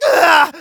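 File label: pain severe, German; Scout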